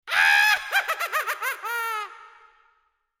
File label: witch laugh